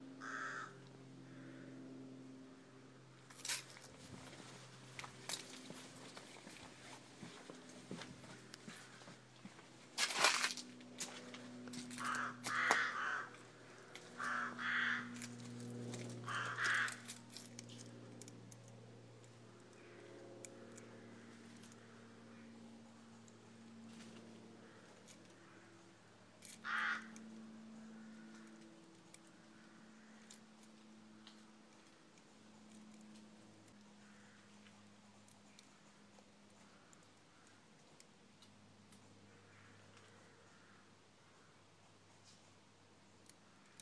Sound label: rooks cardross